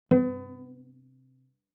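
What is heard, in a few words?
Cello pizz fortissimo sul D B3; MIS Pitches - 2014; Strings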